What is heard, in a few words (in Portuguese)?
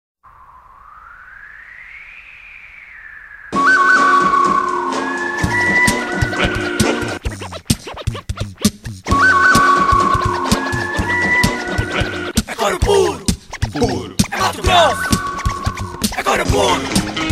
coro puro